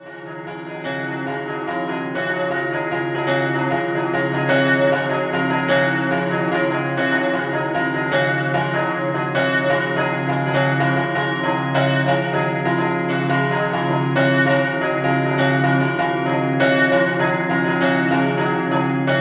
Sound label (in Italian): NOGAREDO DI PRATO, campane